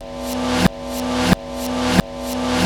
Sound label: Snare, Snare Patterns; Beat Components